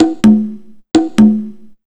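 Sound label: 127 drum 2 L, Fresh Disco House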